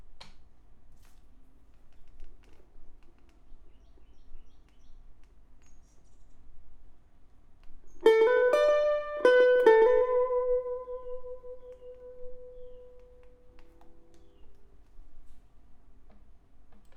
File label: Elect Guitar